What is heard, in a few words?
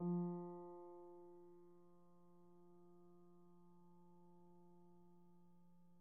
piano pp, steinway